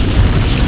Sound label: door Slide